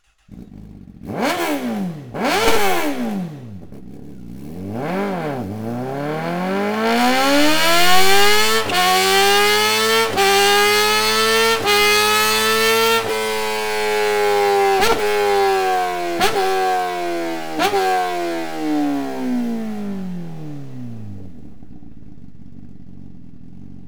gp slip on exhaust honda cbr1000 rr sp sp2; akrapovic

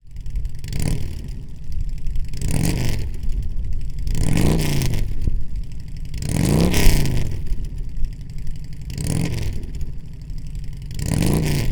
Softail 103 2-1 Rev

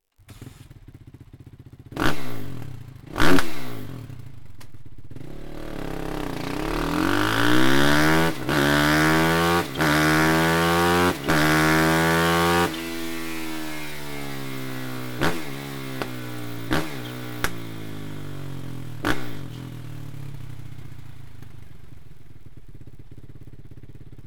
slip on exhaust kawasaki kx450 f; akrapovic

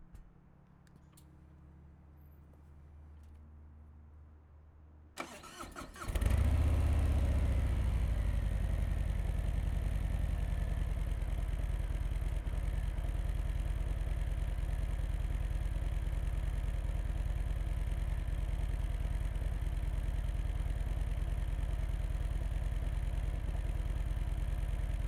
Fat Bob 107 Idle, slotted slip-on mufflers; rinehart-3-12-slotted-slip-on-mufflers-for-harley-softail-2018

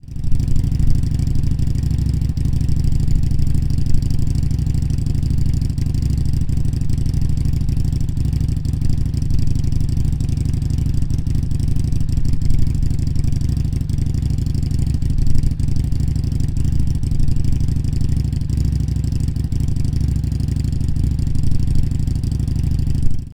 Street 500 Slip-On Idle

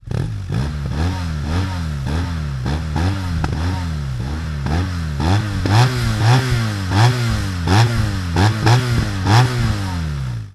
hexa cone slip on exhaust bmw r1200 gs adventure; remus

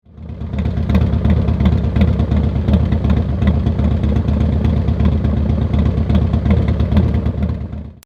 IDLE, pro pipe exhaust for harley softail

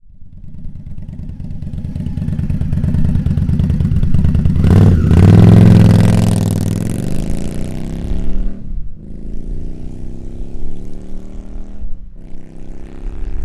Indian Touring 111 4 Slip-On Take Off

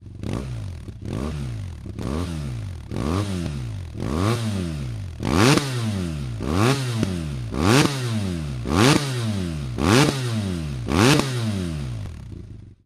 slip on exhaust bmw f800 r f800 gt; remus black hawk

